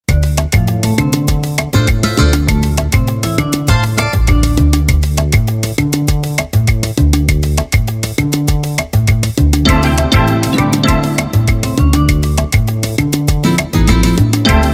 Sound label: Mambo